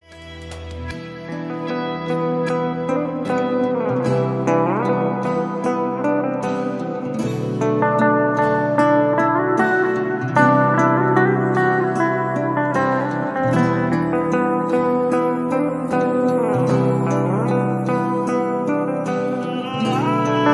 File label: Instrumental Tone